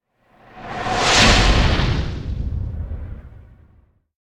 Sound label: Whoosh Fast Air Fatal Strike Huge; Hollywood Edge; sound designer's toolkit v2